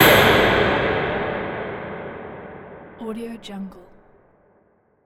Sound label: hit metal